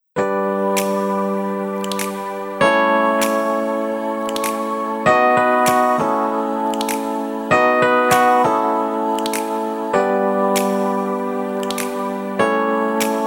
szignal; hazunk tajan

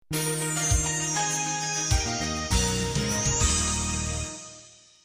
Reggeli Jingle

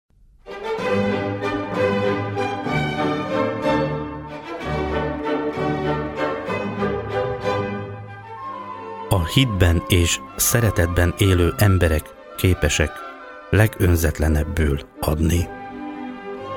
Pénzkérő Jingle

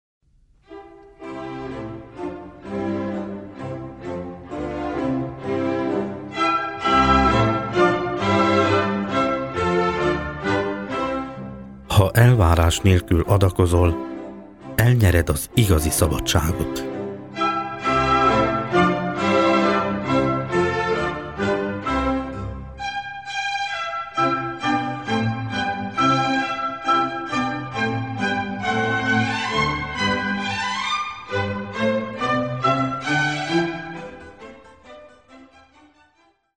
Pénzkérő Jingle

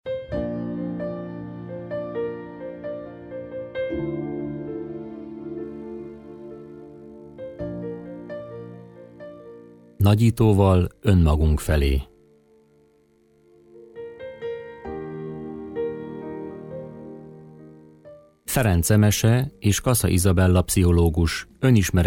Szignal; Nagyitoval onmagunk fele

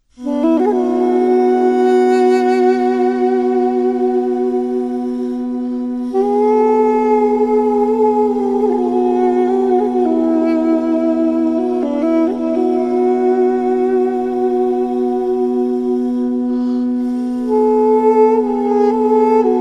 Instrumentalis passio

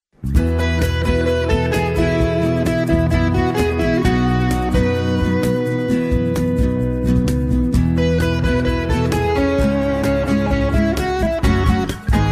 Szignal; Bárka

